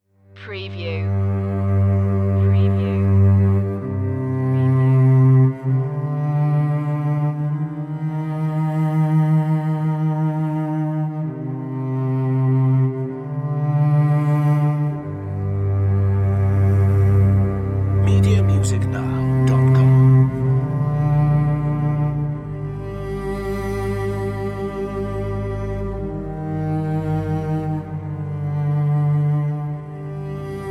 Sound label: dark thoughts cello strings; orchestral soundtrack slow emotive